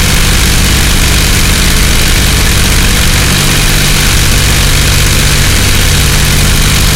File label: stuka side, engines; War Thunder